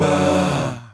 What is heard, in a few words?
Male Voice, African Voices